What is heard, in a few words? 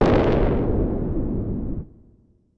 shot; Monster girl quest Part1,2,3; Visual Novels